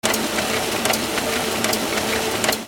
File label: INDUSTRY